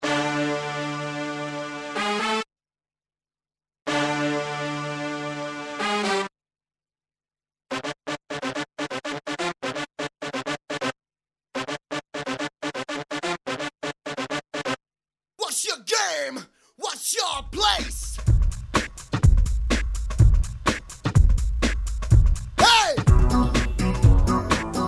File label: Big Beat